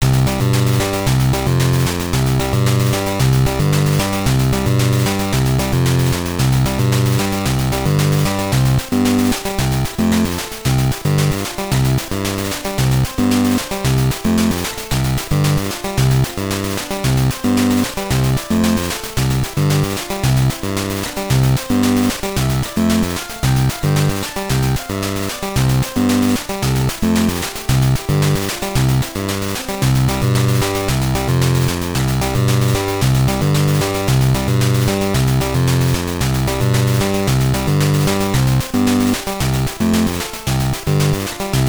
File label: Victory; monopoly; nes